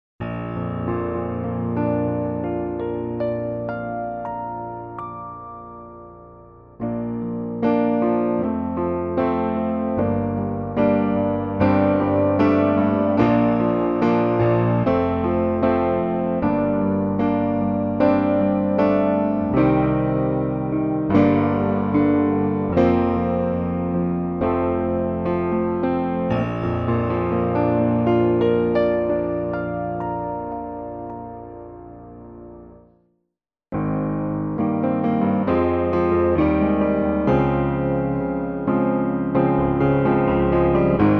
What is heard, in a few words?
grande piano